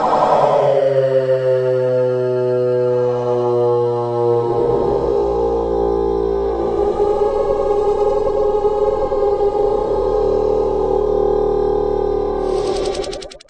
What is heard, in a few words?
vox drone, Robotic or Stuttery or Spooky